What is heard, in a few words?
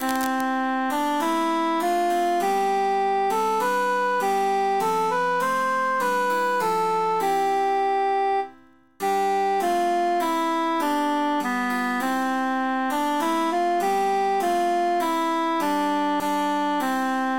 cantus emphasized; we be three poore mariners